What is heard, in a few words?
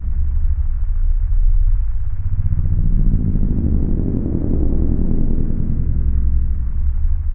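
drone loop, ambient atmosphere; video games; source engine; portal2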